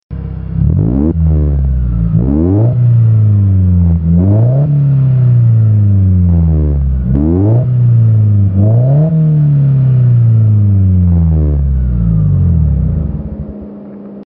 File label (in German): 4 Zyl Stehendes auto